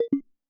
walk; ゆめ2っき

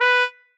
marimba trombone; ゆめ2っき

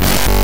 Glitch

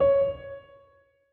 marimba; ゆめ2っき

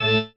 marimba grave; ゆめ2っき

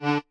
marimba chainsaw; ゆめ2っき

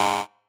marimba bike

